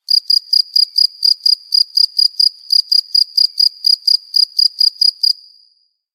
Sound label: Crickets